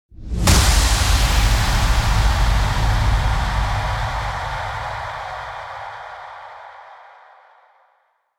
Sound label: IMPACT